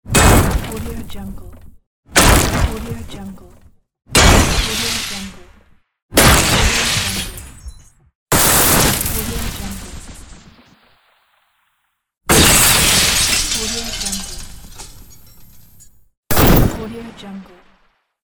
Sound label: destroy; volkovsound